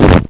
explode; npc

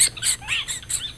rat death

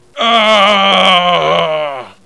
argh; gangster

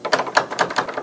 latch locked; buttons

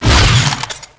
rail start, weapons

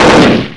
fire

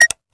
inventory click

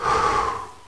breathing end

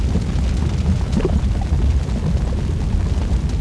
lava

